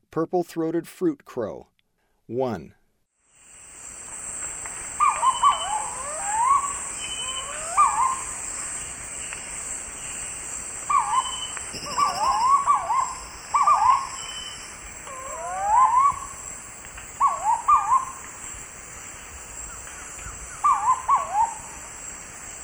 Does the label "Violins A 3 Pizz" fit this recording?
No